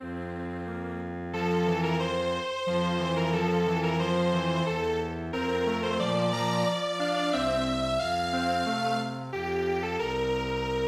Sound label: Strings Quartet